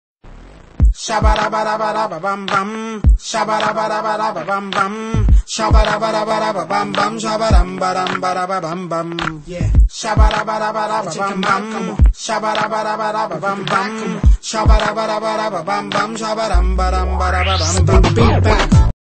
Salsa Ringtone

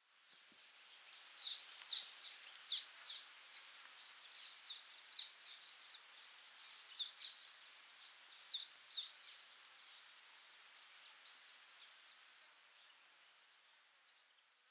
Common waxbill

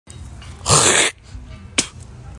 spitting

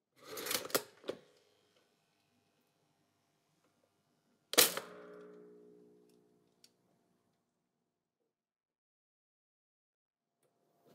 toaster

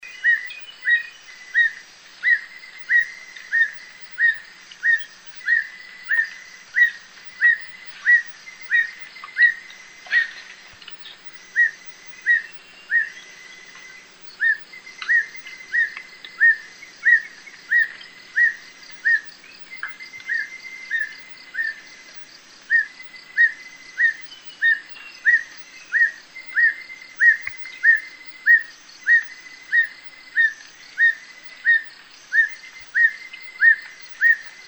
Black Bushbird; پرنده بیشه مرغ سیاه

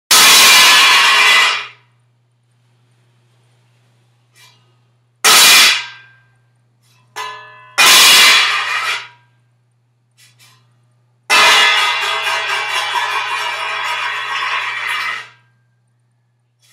metal tray; افتادن; افتادن سینی فلزی

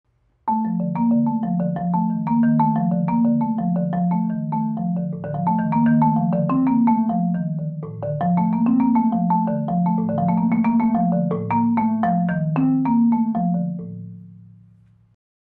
Marimba

